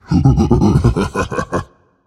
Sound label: hehheh; smite; hunbatz